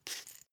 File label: glass pepper grind smooth single; berufe; gastronomie; soundpool